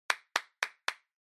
Applause Stable Short Fast

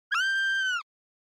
Horror Scream Low Dry

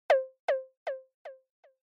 Percussive Accent Delay

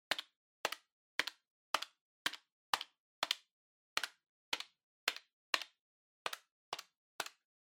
Calculator (Hand Single)

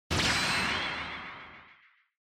Motion Agility Long

